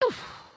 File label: breakdance grunt; emotes